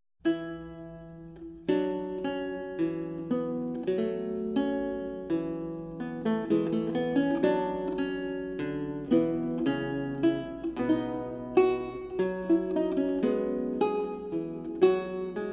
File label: Recercar, on renaissance lute